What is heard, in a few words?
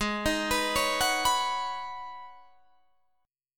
Ab Minor 11th, Ab, Guitar, DADGAD